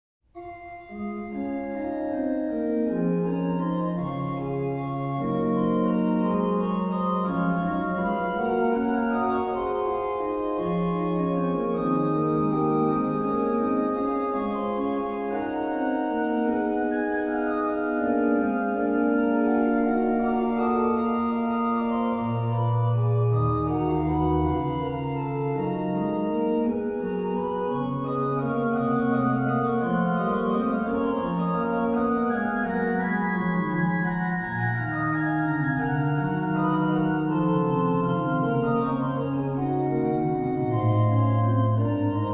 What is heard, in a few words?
Bach Organ Works; BWV 673 Christe aller Welt Trost; Clavierbung III